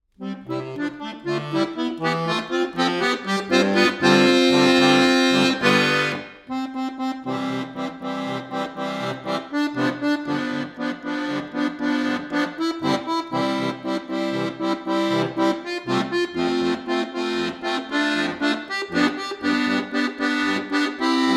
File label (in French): fin ete instrumentale